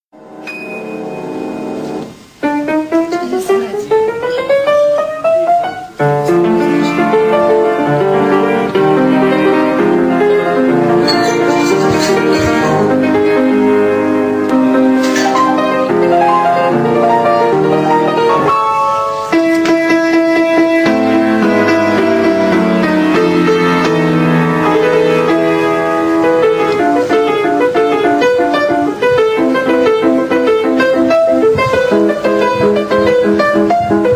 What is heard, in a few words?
on the piano